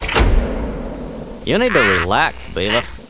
rune use, doors

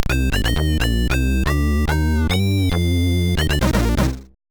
guitaring, SIDTunes

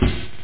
jungle

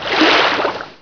fish mov, monsters; HereticII; Heretic II Demo

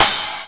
cymbal; Ultimedia 2; sample creator

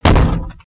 met strk, bugs; Starship Troopers

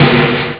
solo, drums snare; OctaMED SoundStudio V1

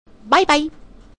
escape; funway

